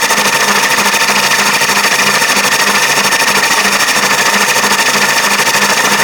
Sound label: Space Pod Melee Arm Loop; Grineer; Vehicles; Warframe